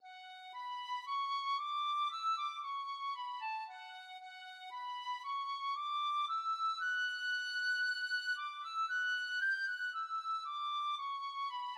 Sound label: tin whistle